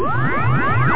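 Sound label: disruptor; star trek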